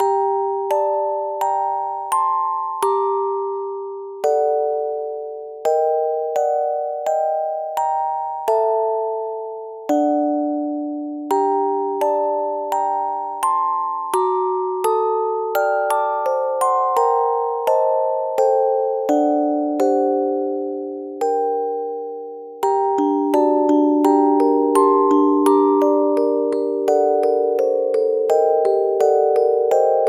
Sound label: musicbox mystique, 暗い